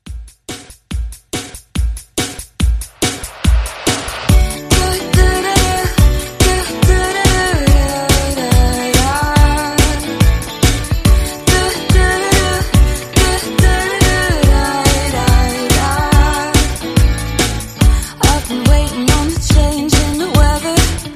Christmas Mixshow Re Drum 142

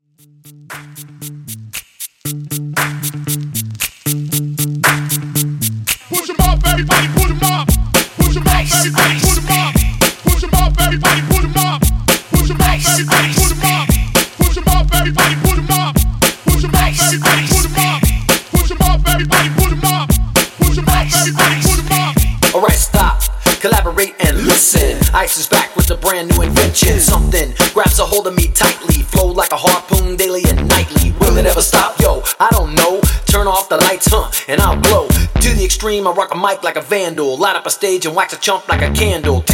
Party Hype 116Bpm

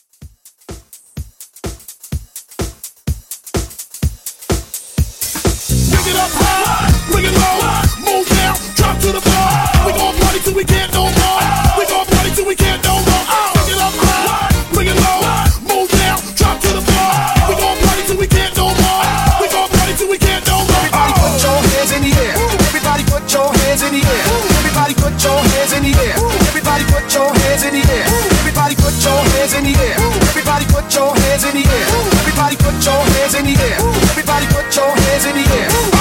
80s Old School Hype Re Drum 126

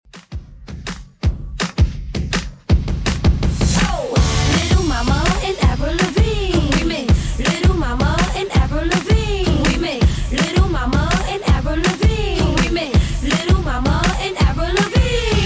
2ks Redrum 164bpm

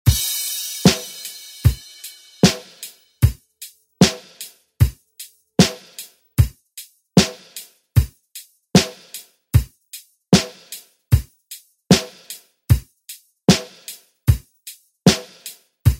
90s Redrum; DJ Mhark

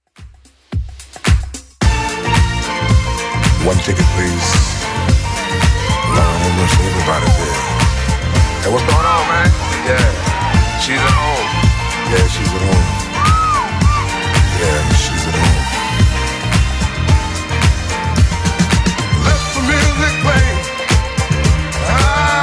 80s Club 110pm

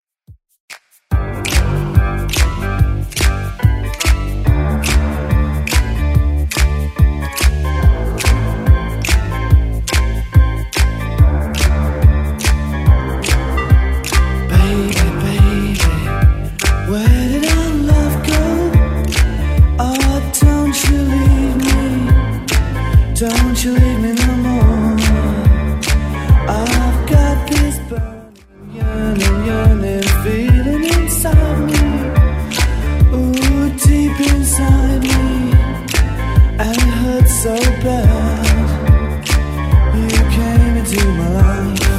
80s Redrum 143bpm

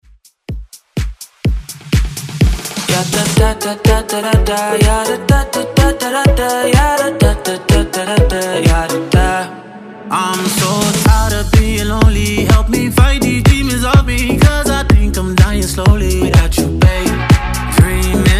Dance

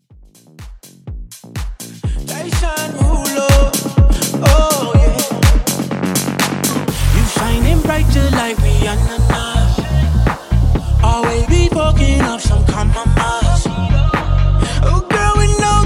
Top40 Tech House; Mashup

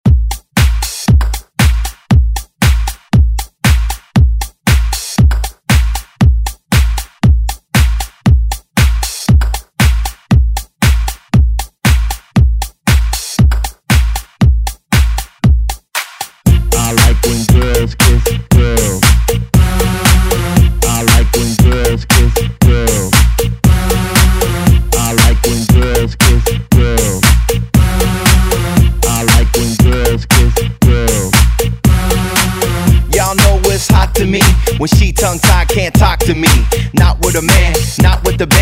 Dance Redrum

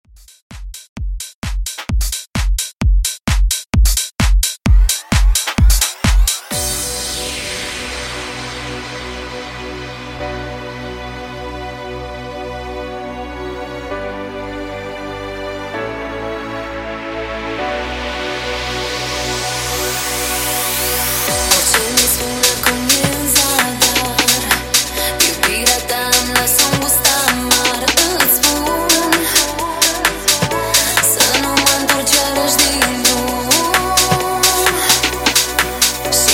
Nu Techno Trance 130Bpm

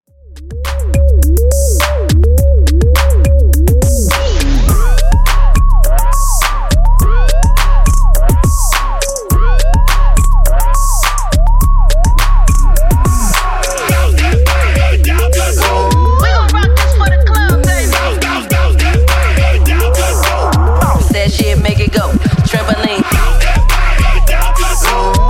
Hype

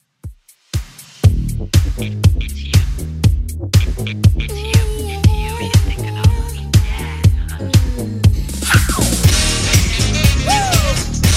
80s Redrum 120bpm

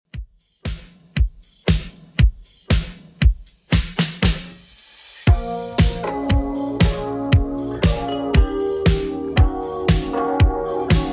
80s Redrum 117bpm

